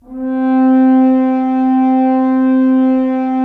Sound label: Solo F.Horn, Roland LA Composer; Roland - LA Composer vol. 4